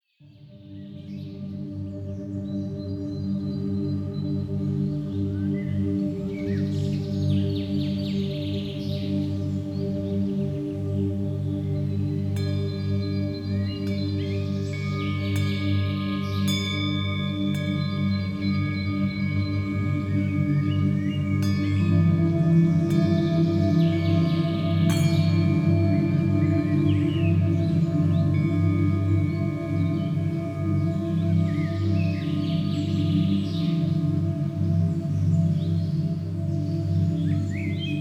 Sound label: forest sounds; healing yoga meditation music consort; zen art